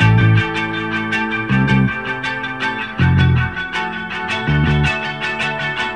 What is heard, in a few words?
guitar, Sad; Loops